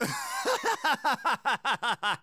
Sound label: ahaahahahahahahah